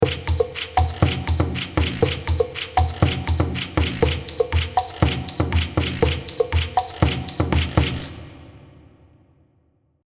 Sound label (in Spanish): las percusiones; mesoamerica